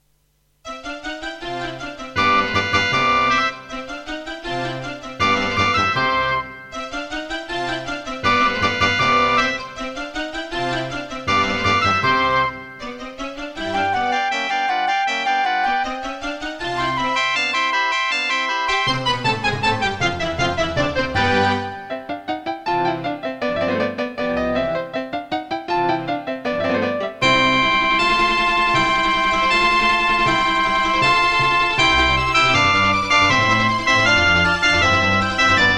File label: Mozart Concerto K467 partial Tascam Pocketstudio 5